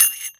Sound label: Fing B. fx, EMU Orbit-3 drum machine; 300 Drum Machines